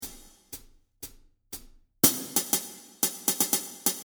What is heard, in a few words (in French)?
rythmique, guitare jazz